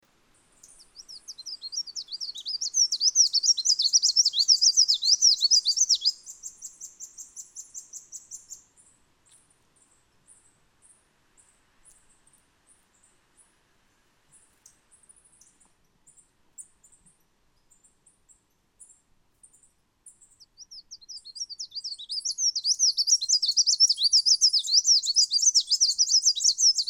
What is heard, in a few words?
collared whitestart; costa rica 2013